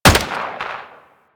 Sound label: Rolling Drone Turret Fire